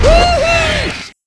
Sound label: Player Join; DunDef